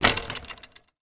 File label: transportation bicycle bounce; sound effects one